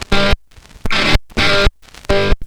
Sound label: GUITARS KIT; Big Beat Samples & More; AKAI